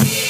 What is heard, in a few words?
Open Hihats, Cymbals; Vengeance Samples